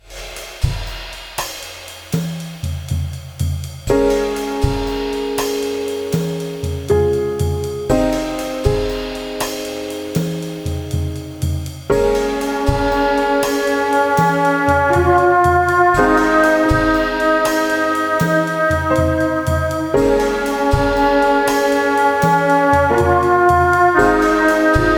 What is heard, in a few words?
Jazz